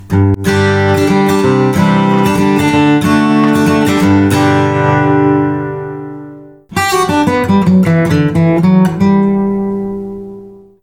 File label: Surfpick Lignum Vitae Wood